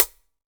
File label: HH CL2 L, Drum 1 Dry; East Collexion